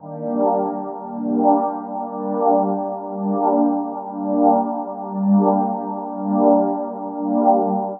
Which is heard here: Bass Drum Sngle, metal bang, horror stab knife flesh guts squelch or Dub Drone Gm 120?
Dub Drone Gm 120